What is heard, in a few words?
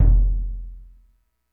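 bass drum, orchestra percussion; Greytsounds VOL-1; ORCH PERC 3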